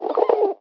Pigeons; rabbids multiverse; iOS, macOS, Mobile

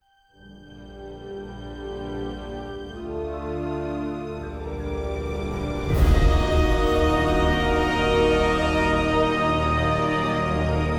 castle music

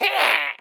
Imp Attack

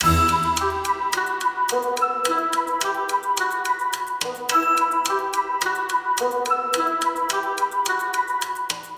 Music BGM loop; Wenjia

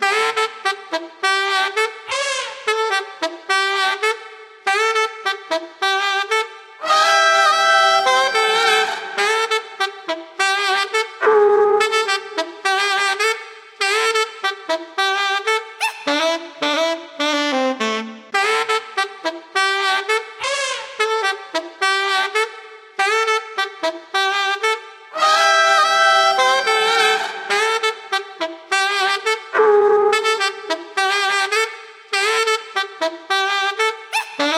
Music Loop

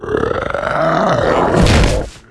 Head Attack

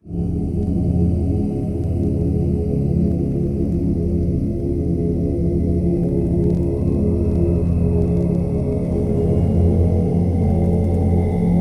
Music Chest; Music Chest Test01

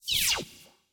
Freeze; rabbids multiverse; iOS, macOS, Mobile

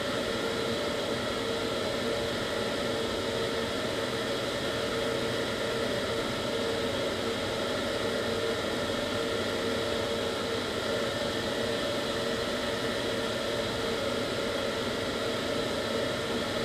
Intro Fan High Broken Loop

